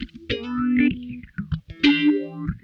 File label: SOFT FAZE, Funk Guitar; 90 s Sample CDs; Zero G